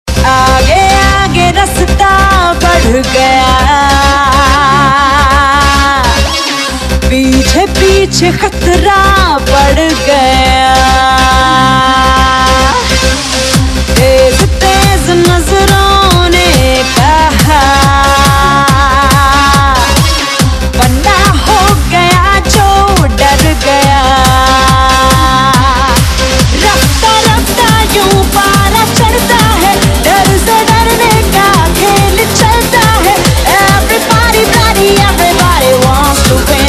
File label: Female Remix; Bollywood; Tezz